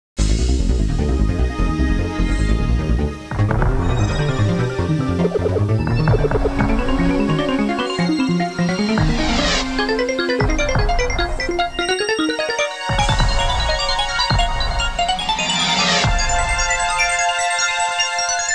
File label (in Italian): musica elettronica